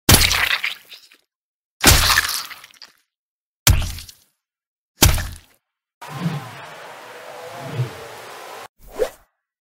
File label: Crushing tomatoes; له شدن گوجه فرنگی